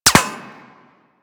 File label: Bullet Impact Metal; Warframe; Weapons